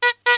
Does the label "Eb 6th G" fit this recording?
No